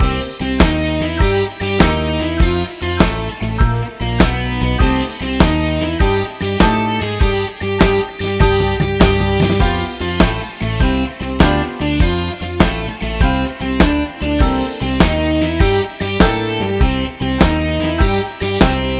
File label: blues; by burak sound bank; blues vol 2